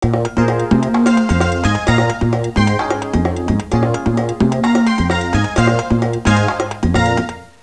suonerie contemporanea; musica contemporary

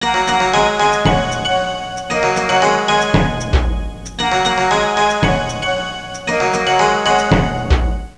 musica traditional est; fareast

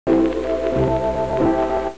suonerie musica hawaiian

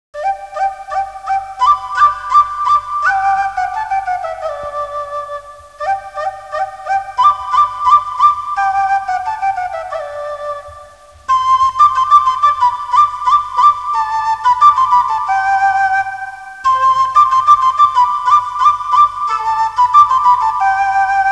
musica strumentale

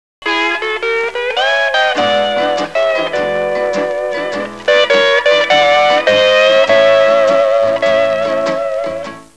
musica strumentale

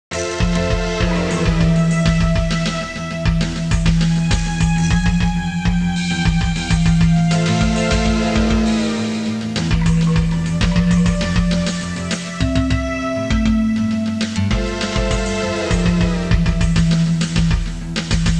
musica elettronica; electronic

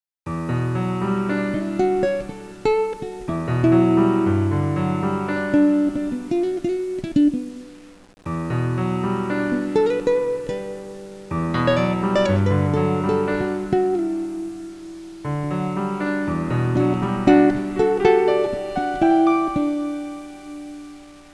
suonerie musica jazz

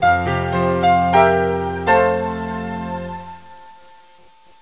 musica newage

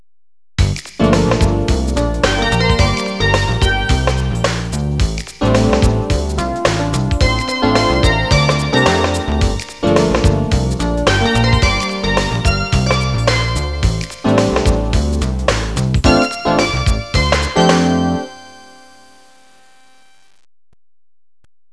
suonerie businessy

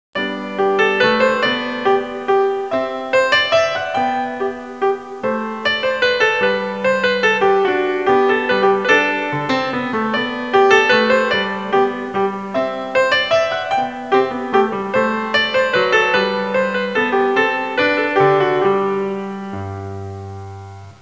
suonerie classica; musica classical

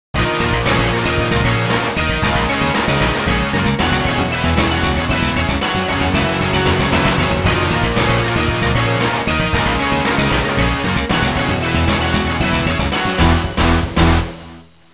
suonerie musica rock

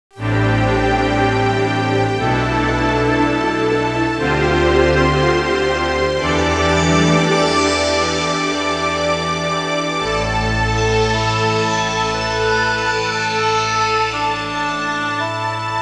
musica sinfonica; symphonic

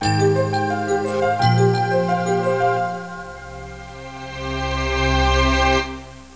musica newage

